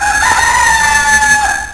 coq

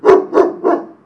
chien, animaux nature

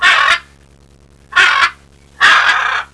perroquet

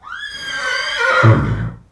cheval

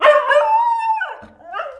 chien

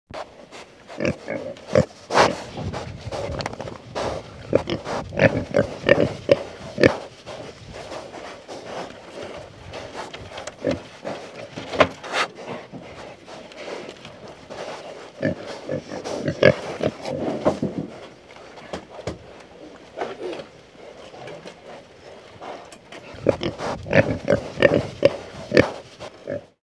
cochon

